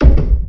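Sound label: ladder wood, player; quakemapping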